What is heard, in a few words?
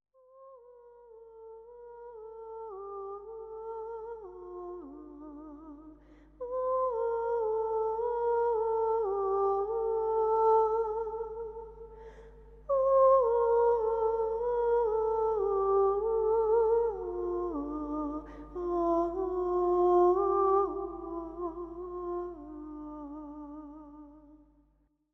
chinese music